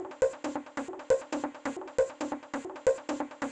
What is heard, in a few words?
synth techno bleep sequence club; synth loops